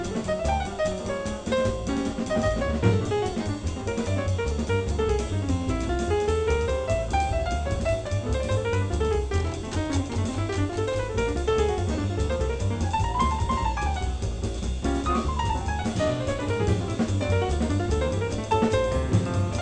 jazz

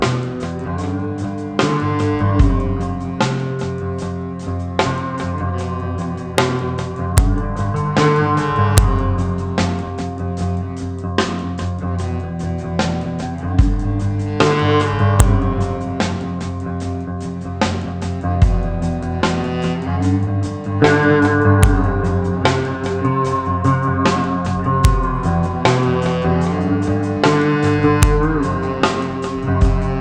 rock

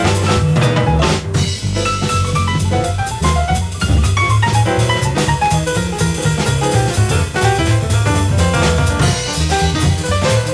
jazz

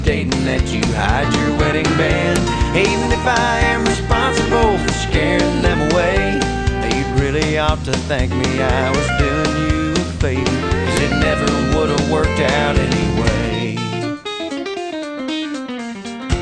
country

